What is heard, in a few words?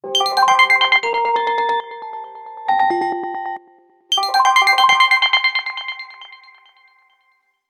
Neon, ringtones, HTC One M7